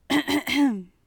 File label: Clearing throat